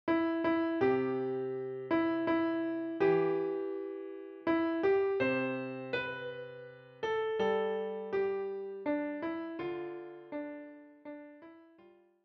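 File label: piano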